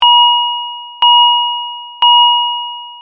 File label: alarm bell, Synthetic sound effects; synthesized with SoX